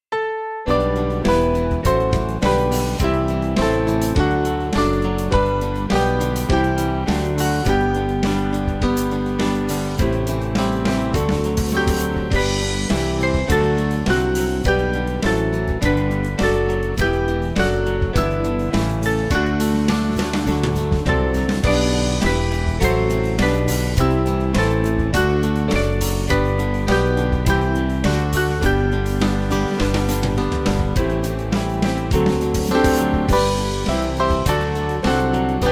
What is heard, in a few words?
The Church Triumphant, Band; Eatington